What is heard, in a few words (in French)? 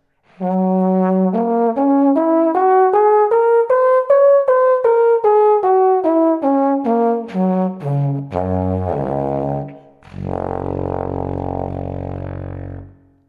C fa diese, alphorn